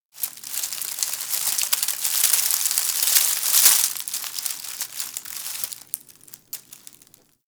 Derelict tree crackle; Interior; Ambience; Warframe; Orokin Derelict

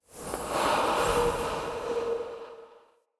Staff Caster Charge Heavy, Weapons; Player; Staves; Galene

